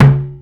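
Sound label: BOTTLE THUNK, percussive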